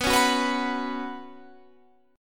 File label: B Suspended 2nd, Guitar, Standard; Bsus2 strummed; 19,16,16,16,19,19; JGuitar